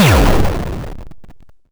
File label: medium, explosions